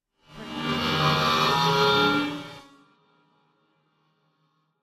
horror metal chaos; soundscrate

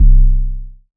electronic kick tone; soundscrate

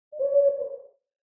Owl Call; SoundsCrate

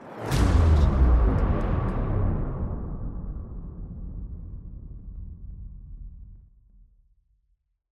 Bass Drop; SoundsCrate

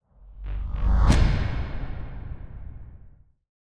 electricity impact; SoundsCrate

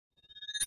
Wobbly Motion Design Riser

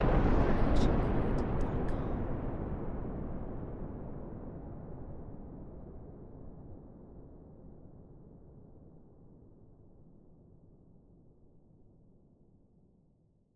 Deep and Far Explosion; SoundsCrate

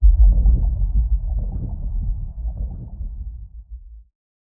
underwater hit; soundscrate

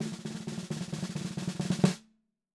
snare roll long

